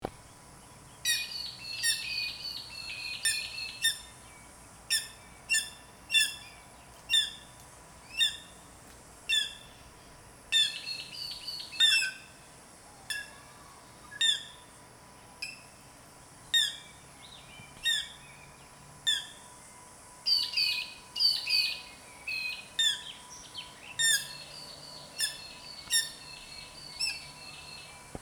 Tachyspiza Fasciata; Brown Goshawk; Call; Call 2

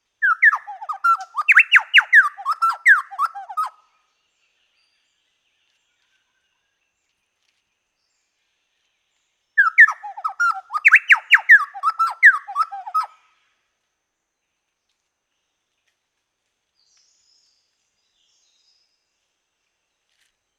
Orthonyx Spaldingii; Chowchilla; Family - Orthonychidae (Logrunners, Chowchilla); Call

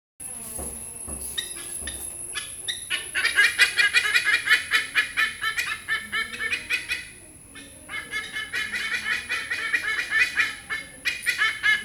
Icthyophaga Leucogaster; White-Bellied Sea Eagle; Call; Call 2

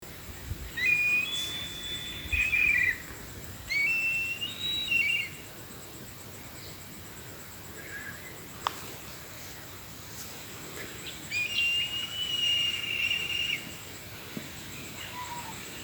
Milvus Migrans; Black Kite; Call

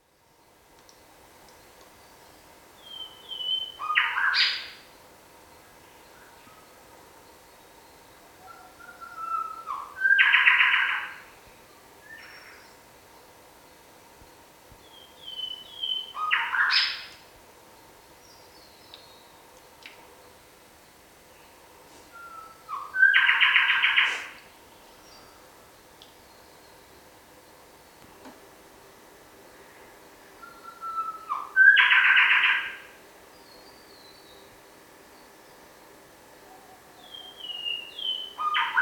Colluricincla Boweri; Bower's Shrikethrush; Call

